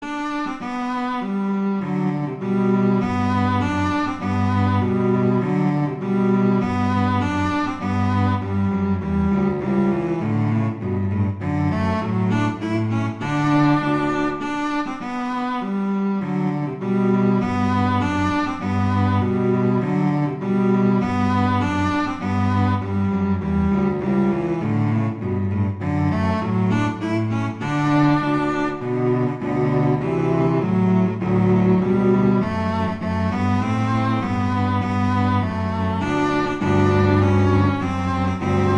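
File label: Duet, horn; Mozart; Duets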